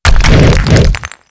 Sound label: ATTACK